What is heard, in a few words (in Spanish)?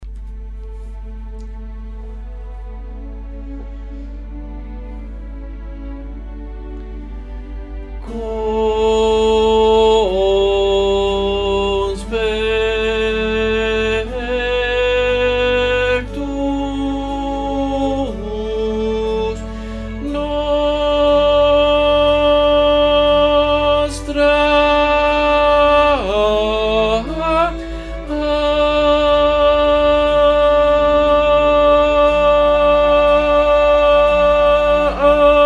Misión tenores, bandas sonoras